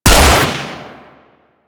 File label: Magnum Shot 2D, Weapons; Warframe; Tenno